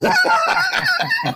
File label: Laugh; why are you gay